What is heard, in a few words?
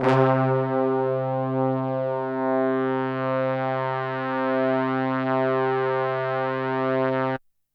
HORNS C2